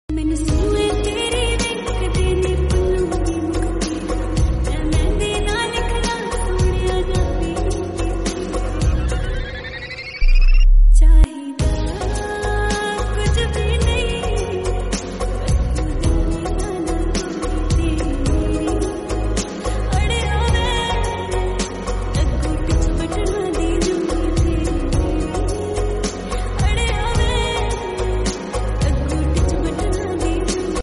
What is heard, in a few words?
Lofi